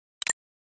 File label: screenshot, nothing phone